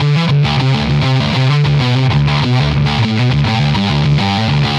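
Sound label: Distortion Riff Guitar; Guitars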